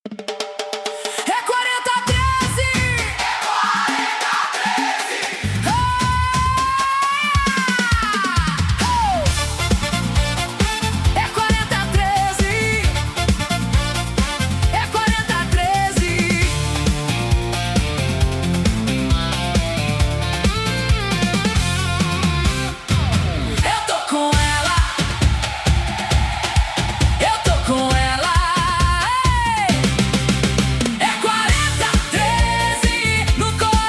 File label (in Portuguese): Jingle